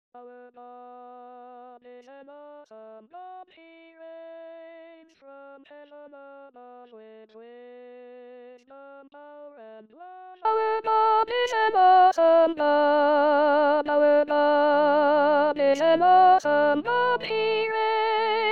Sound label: Sopranes, Gospel